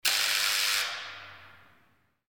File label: Mecha Door